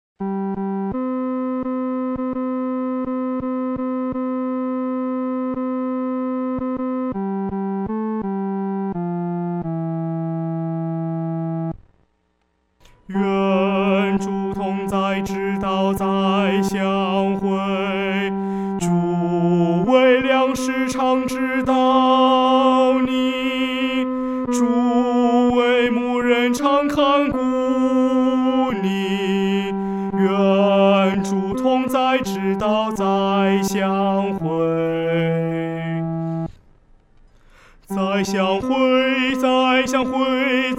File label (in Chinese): 独唱 第三声